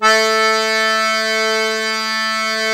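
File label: diatonic accordion A2; USB Soundscan vol.23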